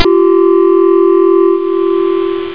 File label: alien bug, clicks